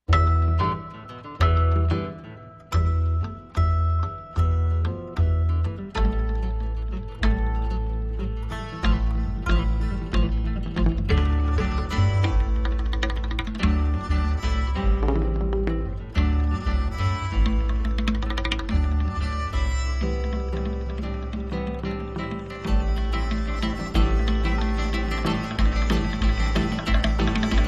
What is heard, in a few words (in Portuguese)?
oficina instrumental